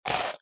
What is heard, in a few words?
bite